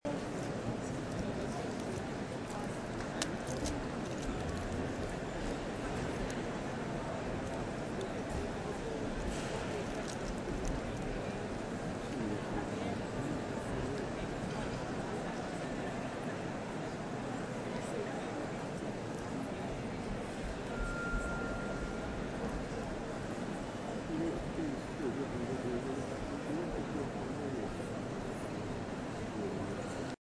royal albert hall prom atmos

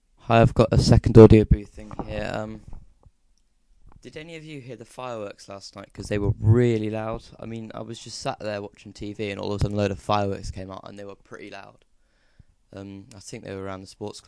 fireworks

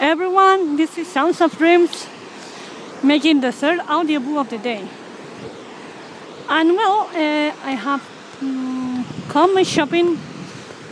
sea waves

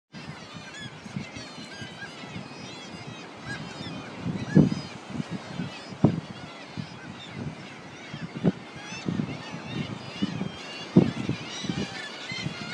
more gulls

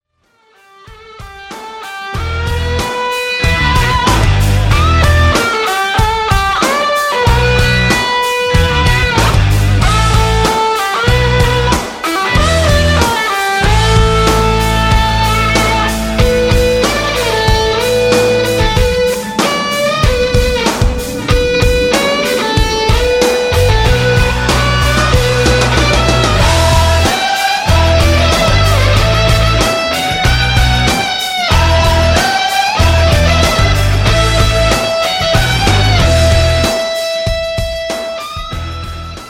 violin

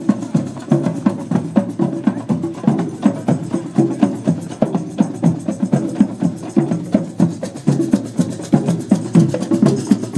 drum circle Asbury Park NJ

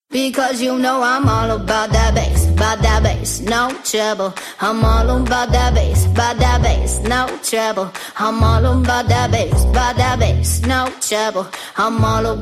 jingle; xperimental gymnasioum

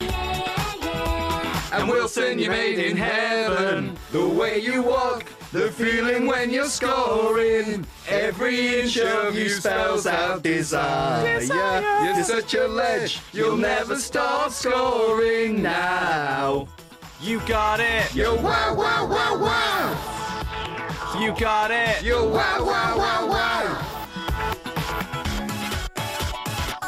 a new chant; Gainsborough Trinity's new signing

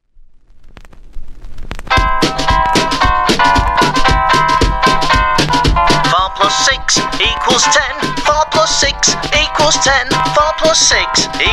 maths jingle

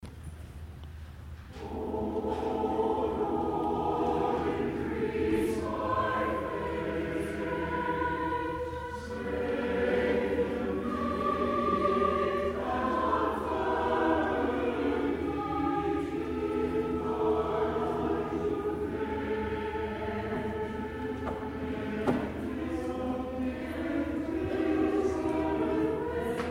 sung by my church choir; o lord increase my faith; orlando gibbons

